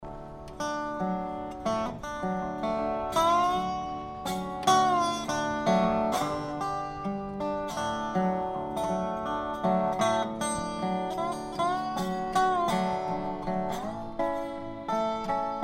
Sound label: Guitar Music